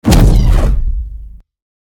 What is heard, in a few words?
activate; smite; atlas